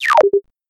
Computer digital lock; may tinh xach tay

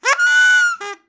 Single party trumpet; buoi tiec

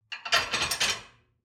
Plate placement on dishwasher; phong bep

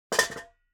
Kitchen pots rattle; phong bep

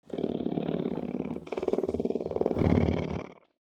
Big wild cat long purr; su tu

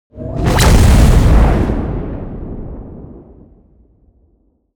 Cinematic laser gun thunder

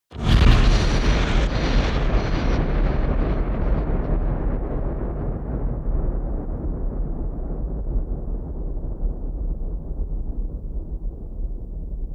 Cinematic thunder hit

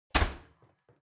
Kitchen oven soft close; phong bep

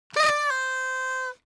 Sad party horn sound; buoi tiec

